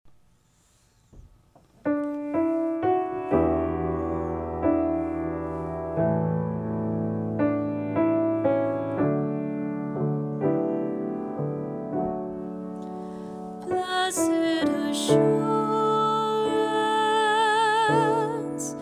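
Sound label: Blessed Assurance soprano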